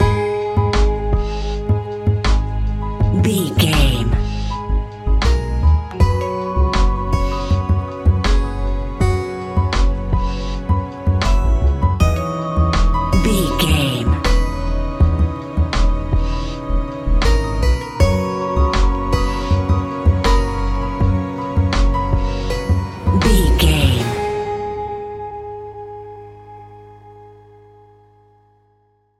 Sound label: nocturnal haunting, A relaxed and chilling piece of music